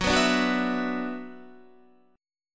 F sharp 7th Suspended 4th Sharp 5th F sharp down Guitar; F#7sus4#5 strummed